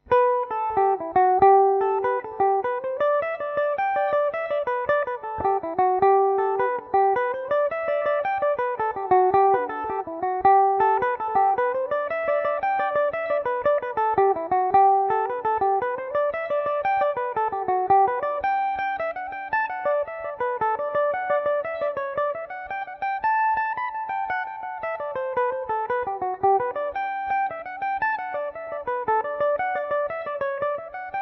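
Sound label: G major, Irish mandolin; lark on the strand; belmuse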